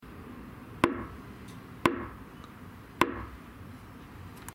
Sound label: knock slow